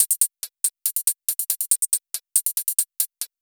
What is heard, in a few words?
electro beats 140